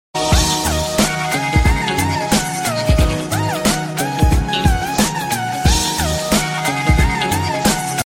huawei flute

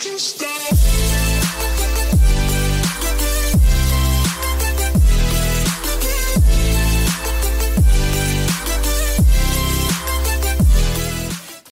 stressed i phone sc ringtone

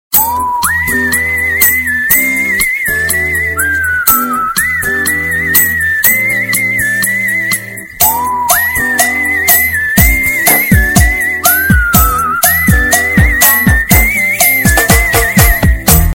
whistle love iphone 6s ringtone

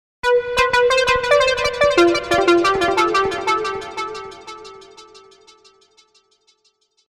iphone 6 metro ringtone

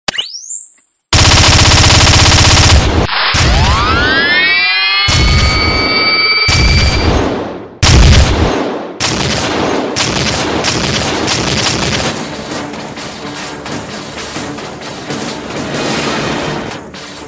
new oppo smart phone ringtone

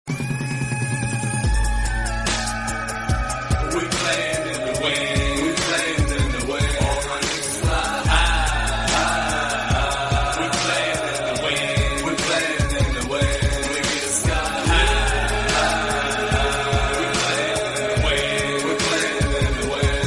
iphone 8 plus french ringtone

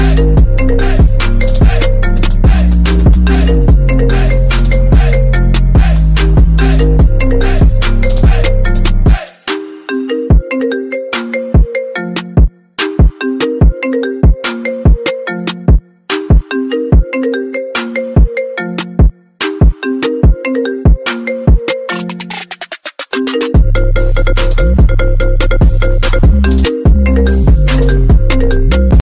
i phone marimba remix ringtone

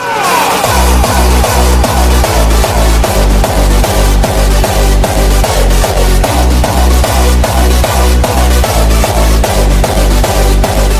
i phone x ringtone

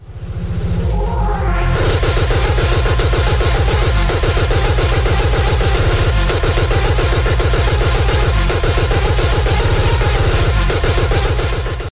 i phone x annoying ringtone

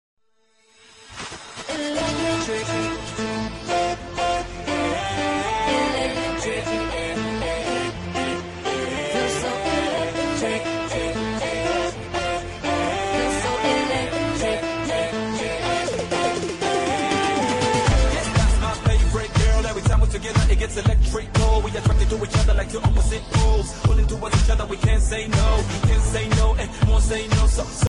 electric ringtone; iphone 6s

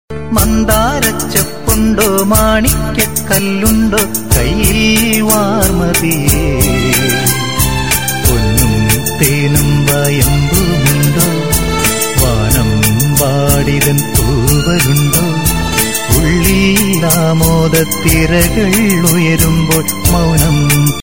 malayalam ringtone

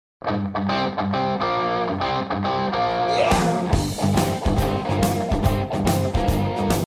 iphone 3g s rock ringtone

